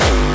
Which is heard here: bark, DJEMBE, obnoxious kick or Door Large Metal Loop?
obnoxious kick